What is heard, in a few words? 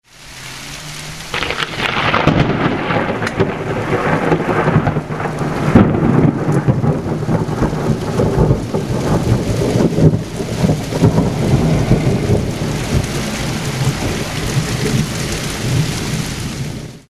thunder